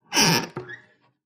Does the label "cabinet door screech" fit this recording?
Yes